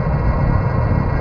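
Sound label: elevator; MOTS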